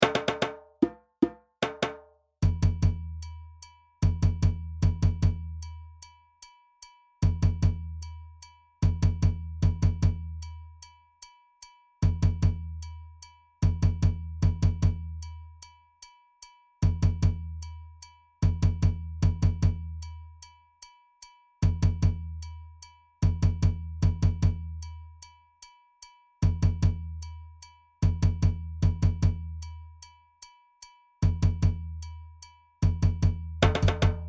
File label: Doundoumba; Sorsonet